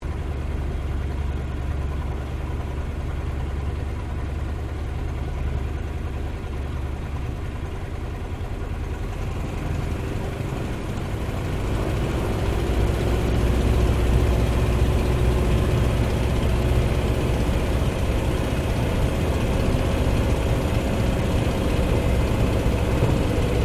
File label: AIRCRAFT 1916 SOPWITH PUP REPLICA TAKEOFF INTERIOR STEREO; Airplanes; Vehicles; Aircraft Collection